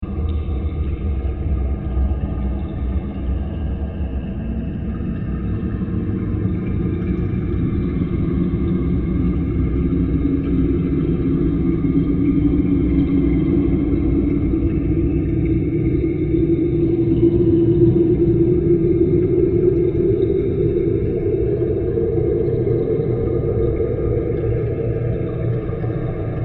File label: Science Fiction Rain Ambience 120bpm